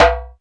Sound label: DJMB, Korg Electribe EMX-1